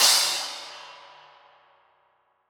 Trash E, drum

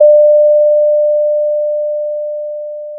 pop, Sound of a doorbell

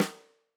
Tam Snr, drum

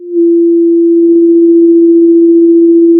pop, Sound of a cruise ship horn

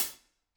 room Cl Hat, drum

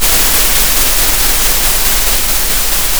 pop, Sound of a thunderstorm

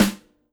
Snr, drum

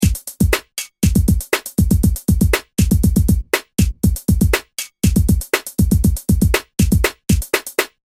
beat

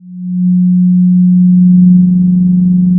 Sound of a cruise ship horn

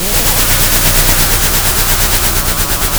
pop, Sound of a train

